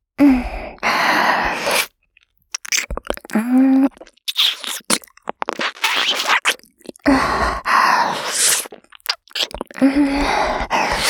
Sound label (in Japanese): リップキス